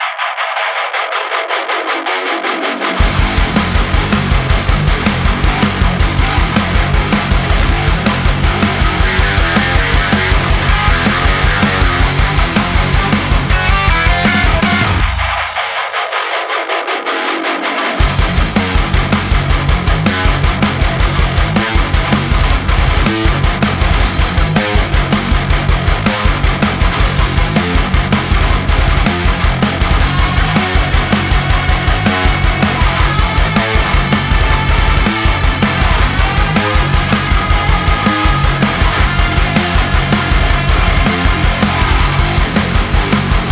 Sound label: (Instrumental)